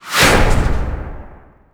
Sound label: bazooka fire; WAR